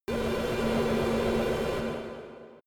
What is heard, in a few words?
space construction machine